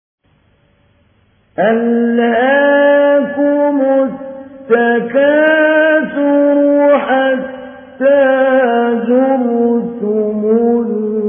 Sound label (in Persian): قرائت مجود; محمود علی البنا; سوره تکاثر